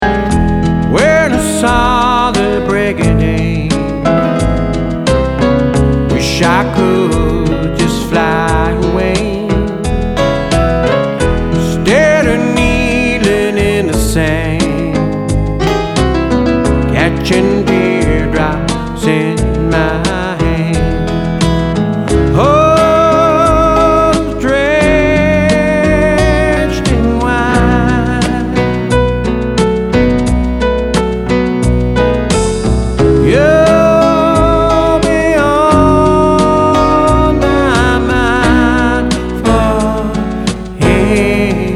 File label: ballad n blues